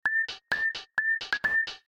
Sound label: beep beep